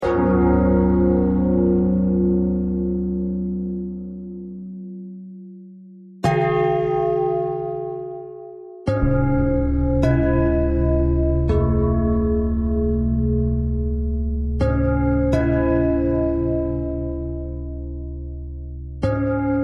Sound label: bell thread